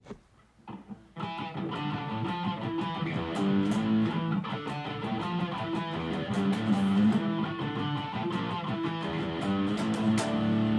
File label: Gitarrero Heavy Begin